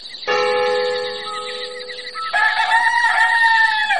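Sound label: MORNING CLOCK CUCKOO ALARM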